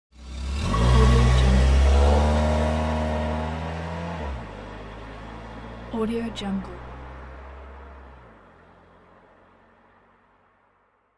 car start drive

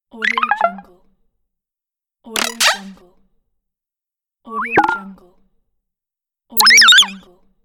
goofy efx

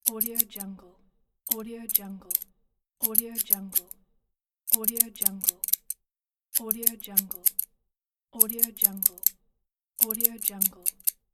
the scissors

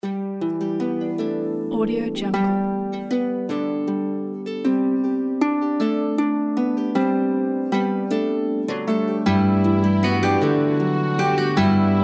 middle ages

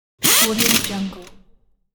impact wrench pneumatic

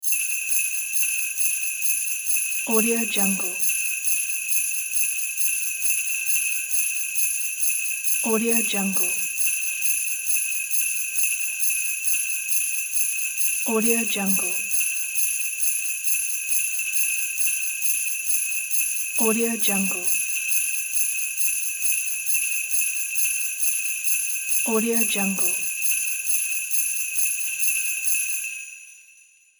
christmas bells

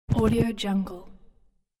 air very fast air twist

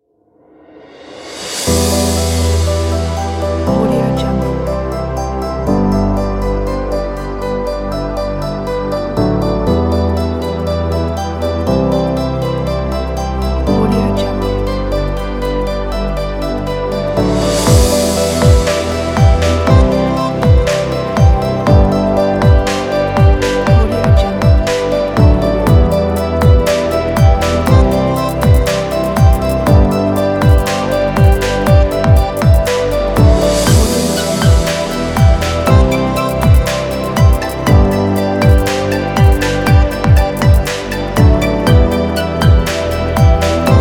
innovation

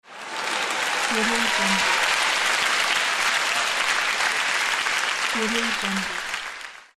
applause crowd

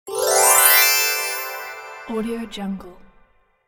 chimes

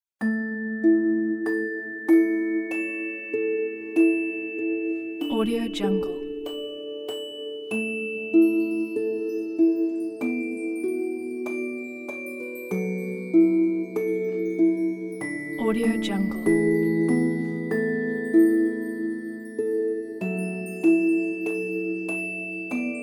calm lullaby harp and bell; lullaby music

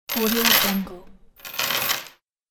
big metal chain on wooden surface

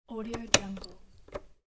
switch

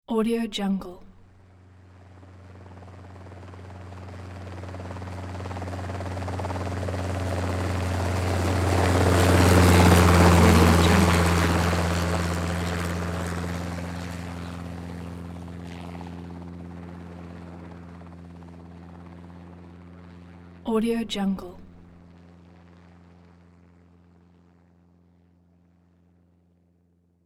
helicopter pass by

